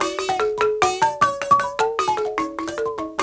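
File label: bent marimba phrase, African; Roland LCDP11; Africa VOL-1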